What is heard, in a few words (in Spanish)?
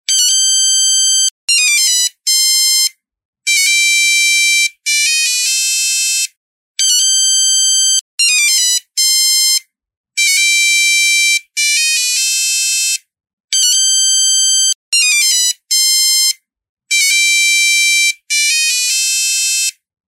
melodia; bach